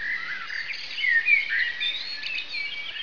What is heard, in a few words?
ambience; cstrike